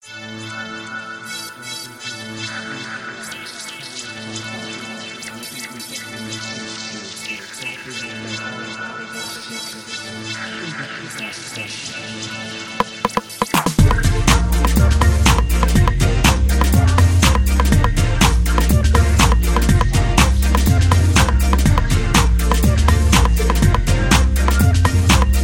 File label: Braindance Ska Ska